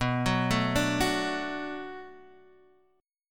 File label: B Minor 7th B, chord